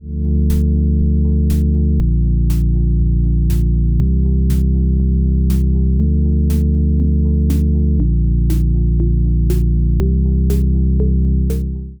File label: neon circuit bgm